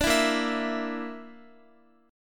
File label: Bb Major Flat 5th Bb down Guitar, chord; strummed; JGuitar